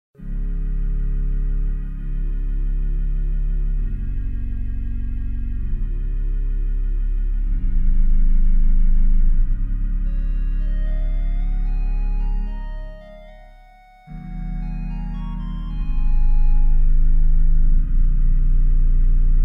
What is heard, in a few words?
Pastorale Wet Chorale Extract; Guilmant